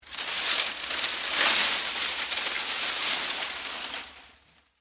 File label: Foliage Movement, Texture, Foliage, Movement, Palm, Frond; Rustling; Objects